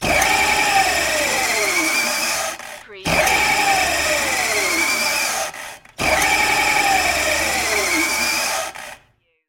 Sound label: Mill Type 2